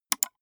gaming mouse left click single fast

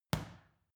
boxing paw hit medium; sport freizeit; boxen

